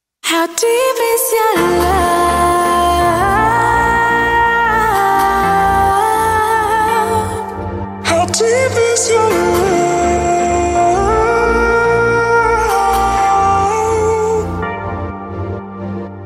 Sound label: Female Version Ringtone